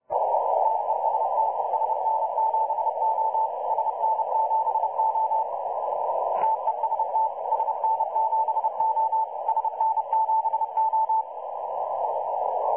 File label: websdr recording; spdxc 2018